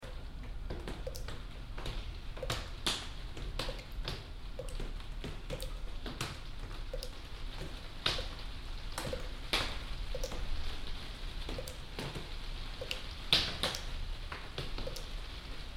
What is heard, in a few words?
binaural rain drips can plastic tics taps